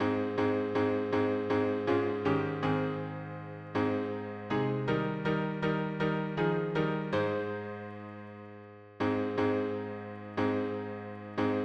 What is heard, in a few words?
harmony piano